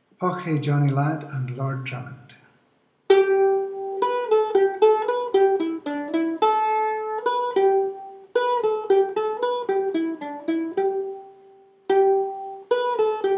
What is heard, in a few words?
Drum